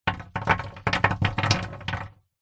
horror coffin small stones dirt handful throw onto coffin box being burried; internal coffin